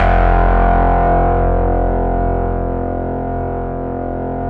RESO METAL F1, Yamaha VP-1; Music Store; 90's Sample CDs

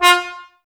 brass, Tbs Mute

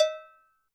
bell, Zoom MRT-3B

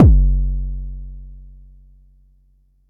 Bassdrum; Jomox Xbase09

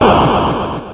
Atari Noise Long, Atari 2600

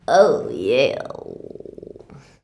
"ooh yeah", shouts; Best Service; Hyper Dance

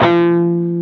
PRS PWR F#3D, Guitars; PRS PWR CUTS

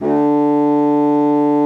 D3, bassoon; Xsample VOL-6

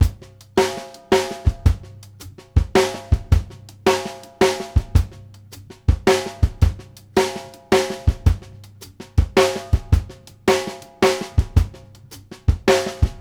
CLOSED H, WALTZ 108; Sampleheads; New York City Drumworks VOL-1; SP WALTZ 108

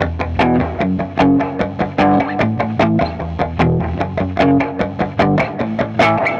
150BPM Guitar Clean E min; Antidote Advent

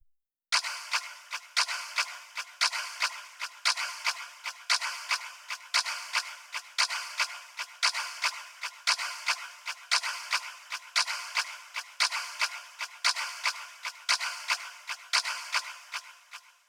Tick Clock

